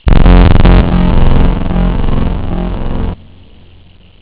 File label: saw octaves; haunted; CYREENE; jazz jackrabbit 2